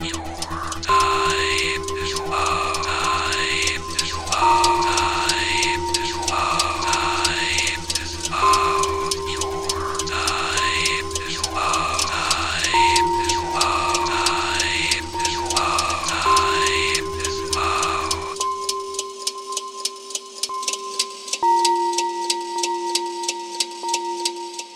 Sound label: Vocal Intro Outro